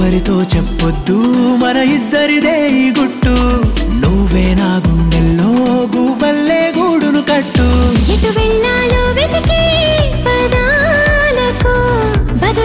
telugu ringtone

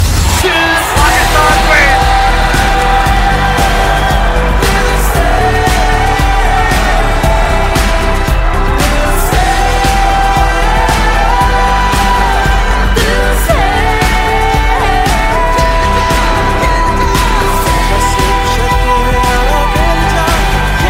cricket music ringtone

